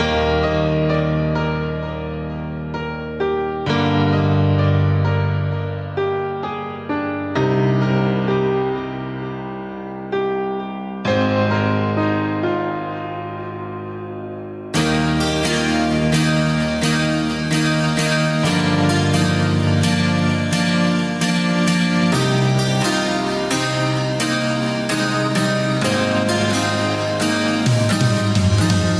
Beautiful Tone

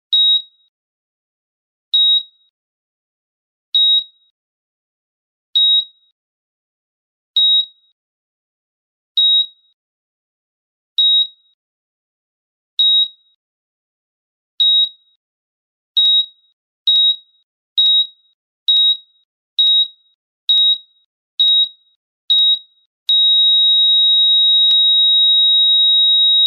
Heart Monitor

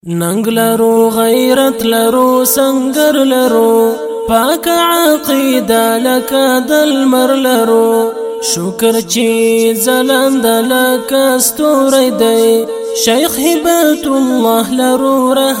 Pashto tarana

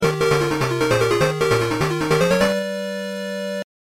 Game Start

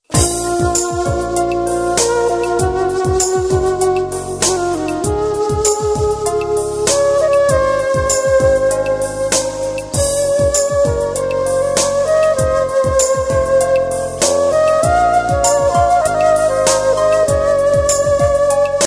Pen Flutes

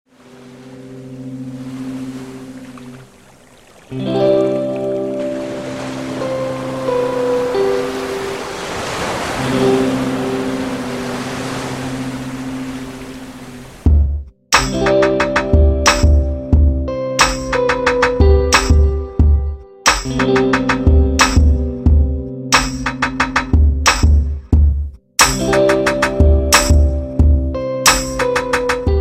Morning Ragga Beats

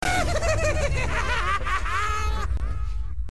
laugh; slimer

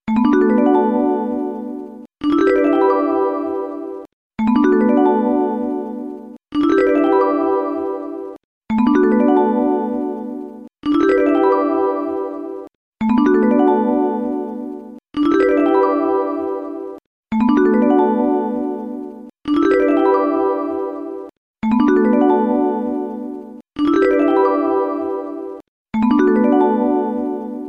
Dream Bells

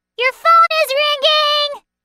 Phone Call

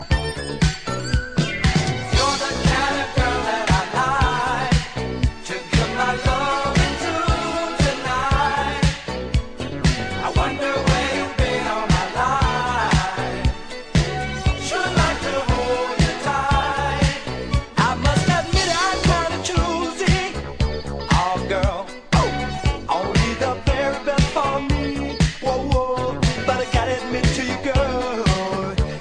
Funk

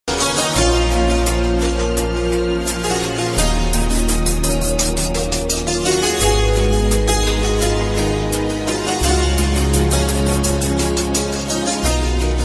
Romantic Indian

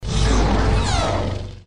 Lightsaber; Kylo Ren

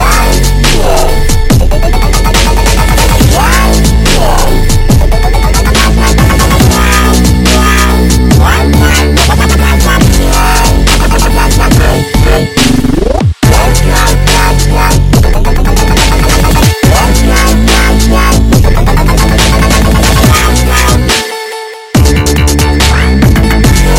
Dubstep

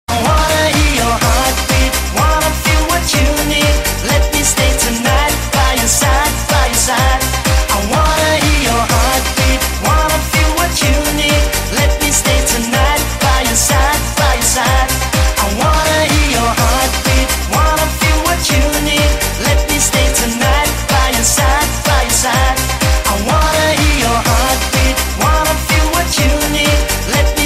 Heartbeat Remix

